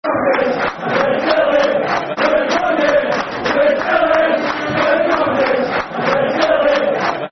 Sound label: Football Chants (Soccer Songs); 31 - 31 Years; Man City Mcfc